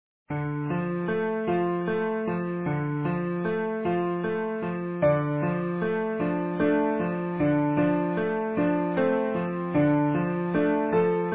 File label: Easy Piano